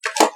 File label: Button Press; Marianne Gagnon